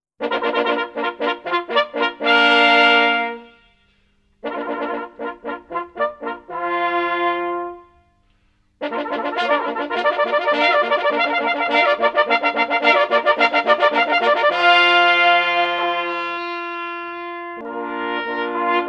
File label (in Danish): jagthornsblæser i stødet flerstemmig